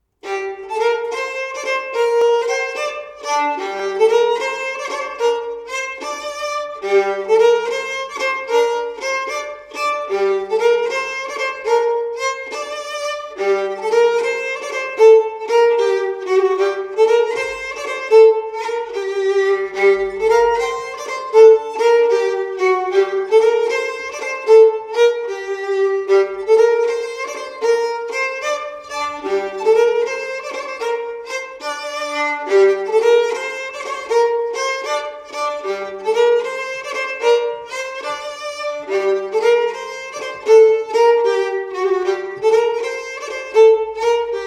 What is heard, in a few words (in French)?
Bourree saintongeoise; Expres pour vous j ons pris cette fauvette